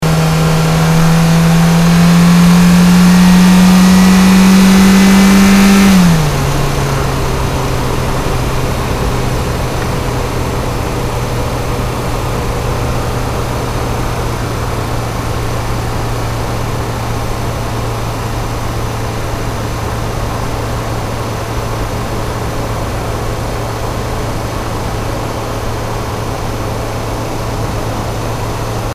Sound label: car ford focus accel gear change engine bay